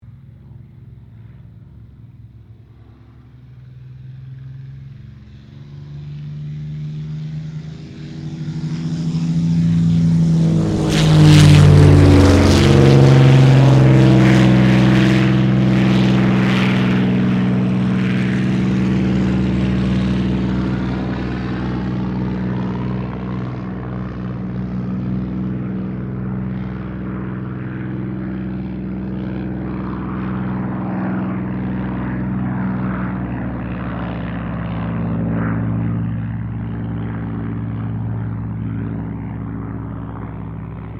AIRCRAFT SUPERMARINE SPITFIRE MK-XVI TAKEOFF STEREO; Airplanes; Sound Effects:Vehicles